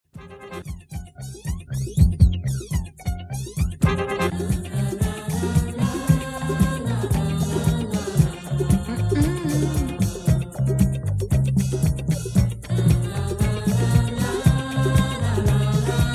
orkestr i vokalnaya gruppa disko

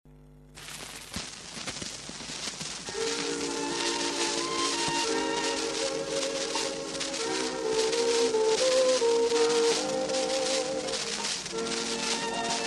belaya siren (vals); Записи с пластинок на 78 оборотов

